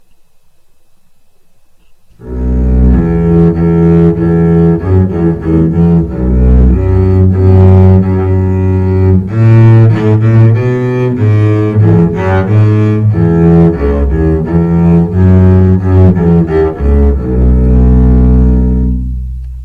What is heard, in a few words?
BASS